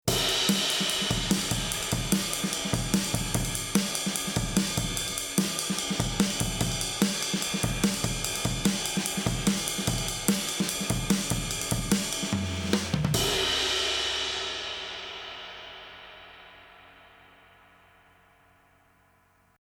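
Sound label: Cameleon Labs 7721 overhead comp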